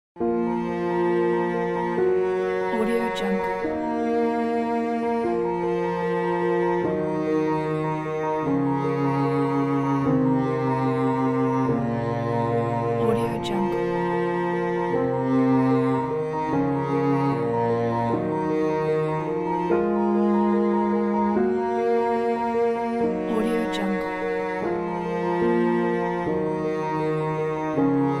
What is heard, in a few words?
the sad ending